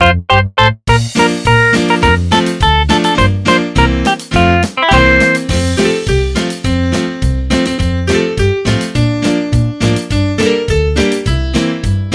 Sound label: JingelBells